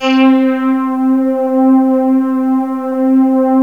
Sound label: Star Theme, Synth SFX; GMTones